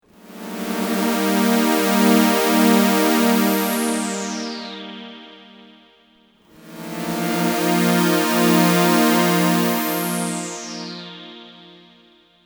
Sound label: thin res